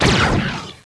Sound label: Disruptor, Weapons